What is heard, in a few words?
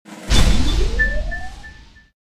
Item Upgrade Fanfare; Metroid Other M; music